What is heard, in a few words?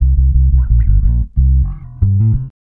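reggae bass C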